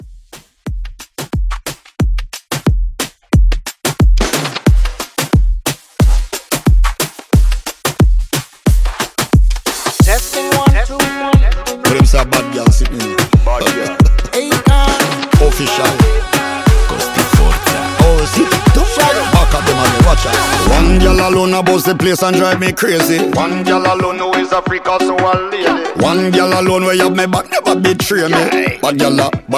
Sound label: Reggaeton Club Edit, 90